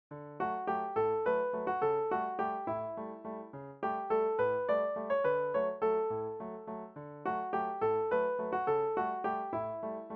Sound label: Tchaik Italian Folk Song